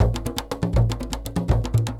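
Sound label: 120 DEEP DR, drum; Best Service Advanced Media Trax Modern Composer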